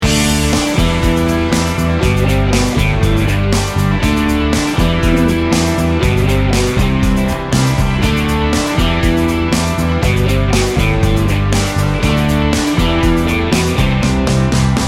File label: americana rock